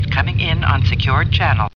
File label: Mail Msg Short